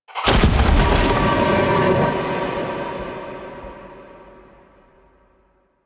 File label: Ancient Door Open, Mountain Pass; Ambience; Warframe; The War Within